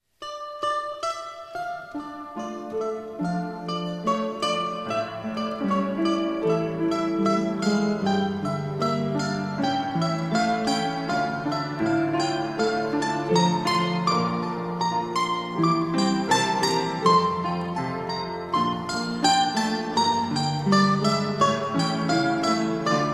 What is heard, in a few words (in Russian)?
Оркестр; Воронеж; Голгофский крест